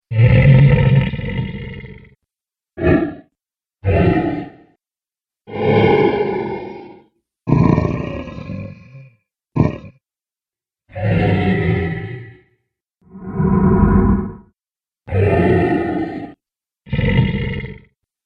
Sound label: Dinosaurs; Dinosaur Sound Effects; animal